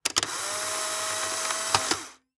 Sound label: polaroid camera take picture